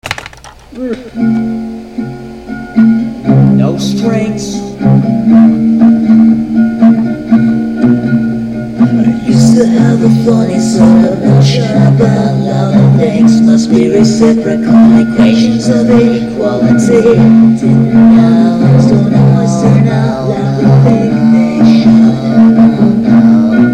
no strings

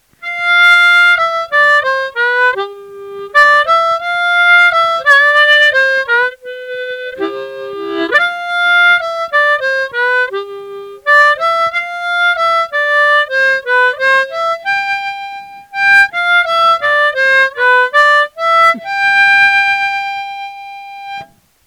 blues

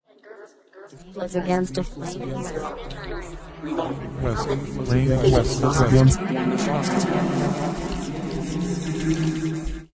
hare drummer

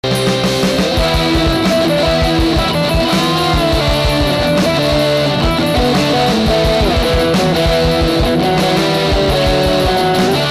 torn down solo

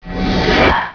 join

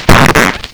fart

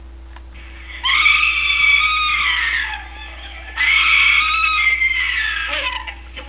scream; Dark Shadows

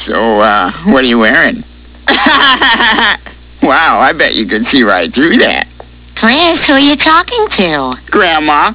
phone

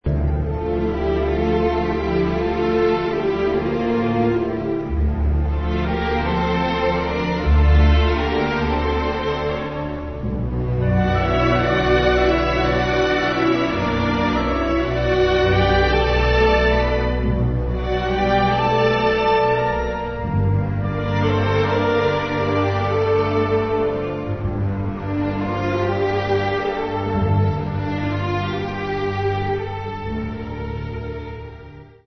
music

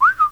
whistel

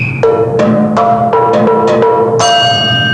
Time Piece Notify

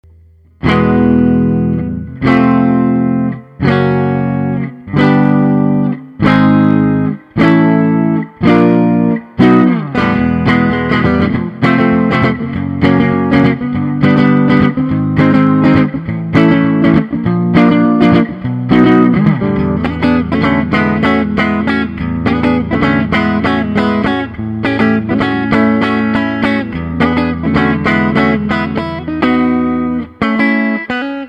strato neck

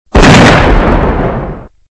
tank

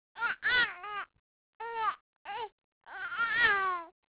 baby cry

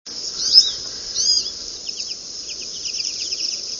goldfinch; birdsongs

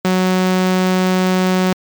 SAW

